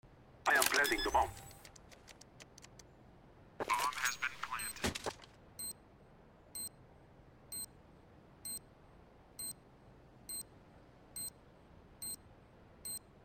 bomba; counter strike

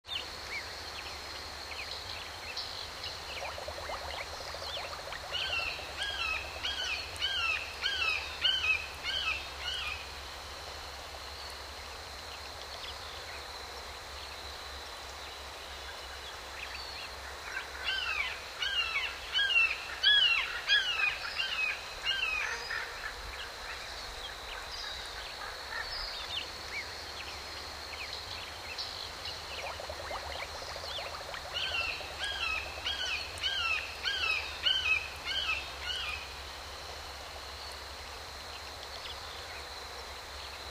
krik jastreba v lesu

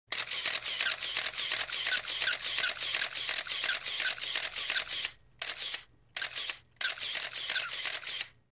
zvuk ocheredi fotoapparata

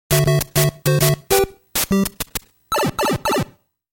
pogruzhenija v trubu; mario

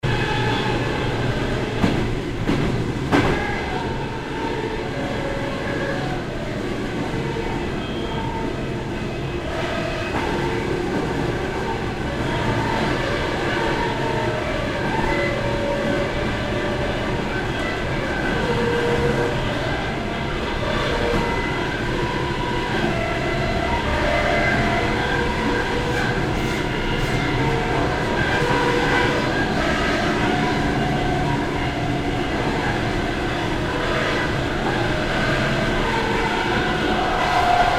zvuk elektrichki

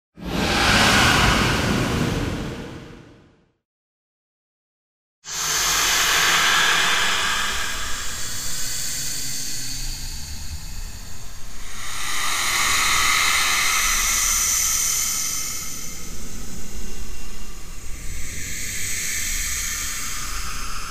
shum prizrakov

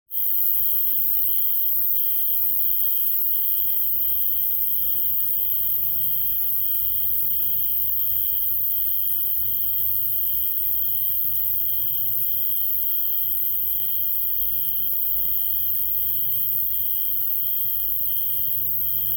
nochnye sverchki

